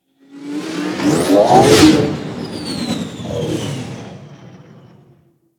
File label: Fighter Ship Close Fly By; Space Fighter Crp Ship Engine A